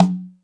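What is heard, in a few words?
Jungle Percussion, Drum Machines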